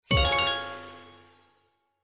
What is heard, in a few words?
Symbol High Win; Wealth Gods